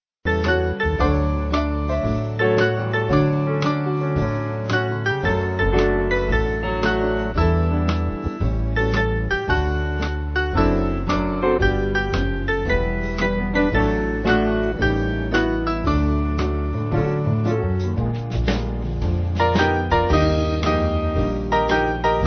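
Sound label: Band, Music Backing Files for Churches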